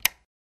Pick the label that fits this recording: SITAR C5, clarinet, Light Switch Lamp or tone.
Light Switch Lamp